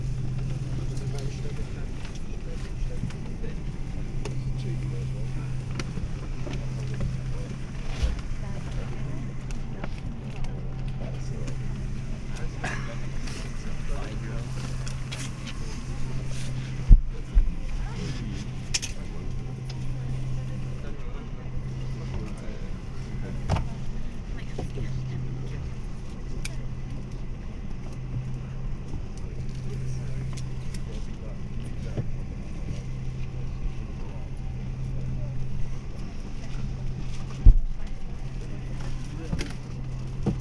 Train Stanstead

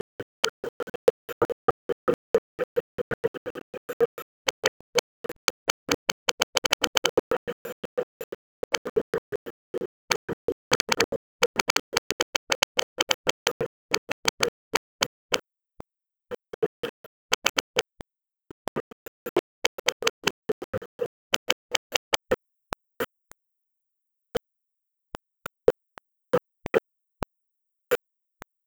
UW soundtrack; Fieldrecordings; Sipadan Video Soundwork

